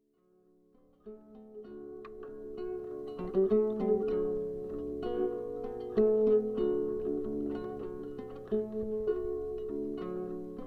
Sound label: loop